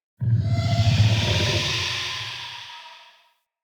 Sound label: Amb Wraith Night Screech; Ambience; Mendicant Area